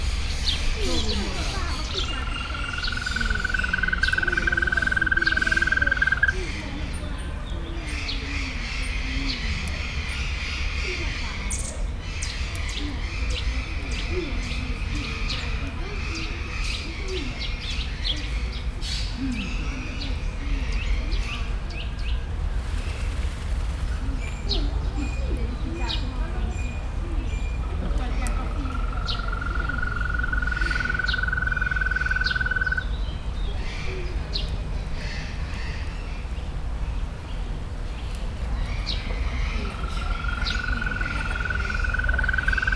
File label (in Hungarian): tavacska gazosában pirregő béka, zöld varangy; debreceni zoo 2019